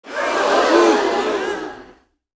Audience shocked gasp sitcom; Crowd

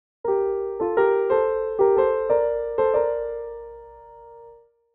Video game success victory win piano

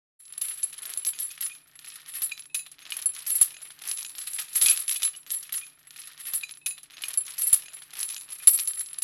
Prison jail prisoner transfer chain metal rattle

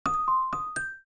Simple message notification

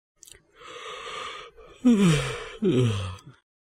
Male yawns in two parts descending

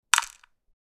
Pill container putting pills inside; medicine

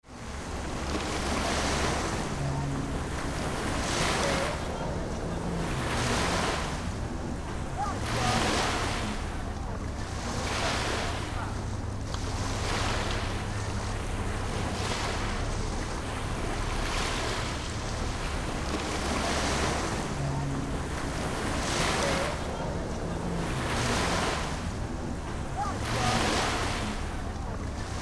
Large waves crashing on a beach kids and adults

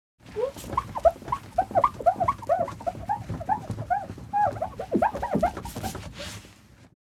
Mirror cleaning glass cleaning wipe

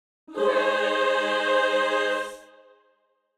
Angelic choir angels; bless

